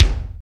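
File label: R8 BASS DRUM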